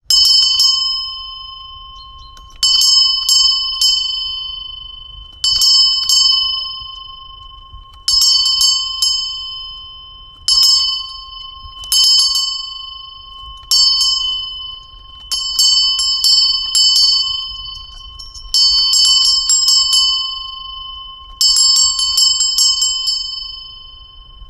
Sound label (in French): la cloche de gavarnie